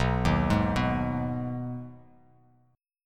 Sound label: B 6th B down, chord; arpeggiated